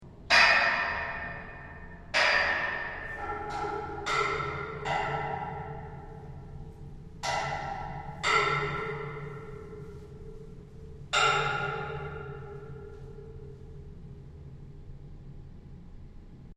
Handle railing hits

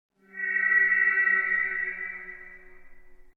gong down

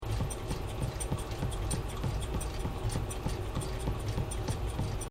Waterbath Shaker

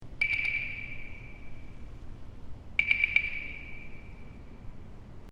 Woodblock multi hit

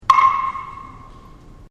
Woodblock

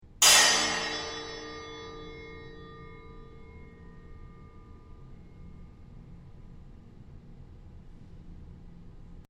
Triangle on railing

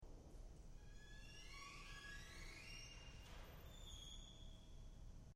gliss w squeak

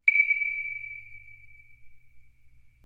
Woodblock

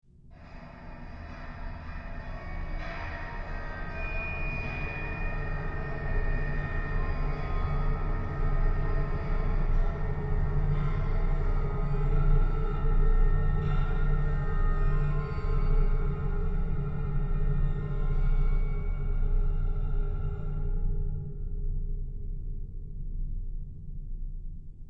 dulcimer c railing hits

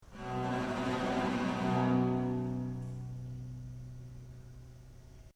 trem

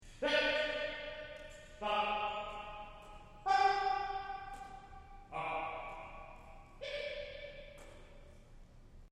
Yips stereo

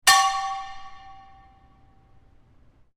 Brake Drum hit

